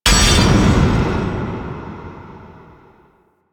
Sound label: Sword Block Break; Warframe; Duviri; Dax; weapons